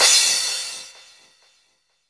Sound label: CYM, Dance Drums